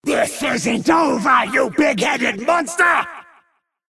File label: Mob Voice Die; Mad Mage